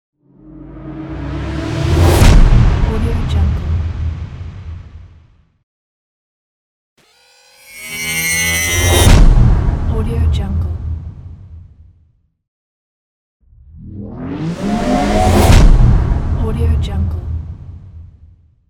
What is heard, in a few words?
spaceship crash explosion